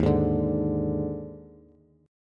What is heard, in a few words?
Eb Major 7th Suspended 4th, Bass; strummed; chord; JGuitar